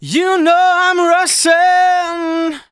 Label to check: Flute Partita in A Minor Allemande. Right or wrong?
Wrong